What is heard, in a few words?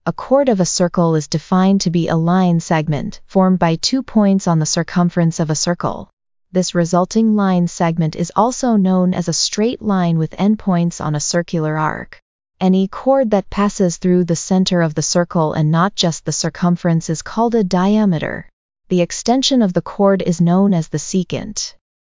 chord